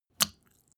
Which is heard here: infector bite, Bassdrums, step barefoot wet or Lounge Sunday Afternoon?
step barefoot wet